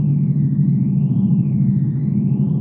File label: ambient corvette hum; Stellaris